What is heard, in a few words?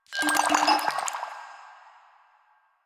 Vial Foley C; Warframe; Lasrian; Lasrian Soldiers; Footsteps; Lasrian Goonadier